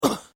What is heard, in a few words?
Pain Umph, Male Painful Grunt, Hurt; Grunt Sound Effects; LYN116 package of human sound effects